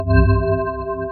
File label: Sci Fi Gateway